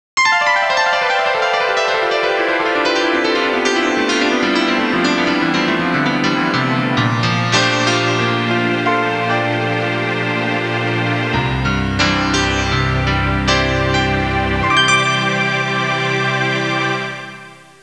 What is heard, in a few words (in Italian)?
musica newage